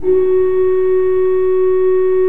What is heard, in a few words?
F3, Cathedral Organ; ILIO; Propeller Islands